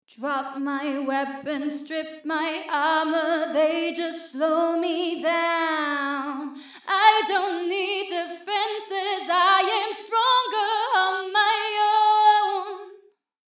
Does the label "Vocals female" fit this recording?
Yes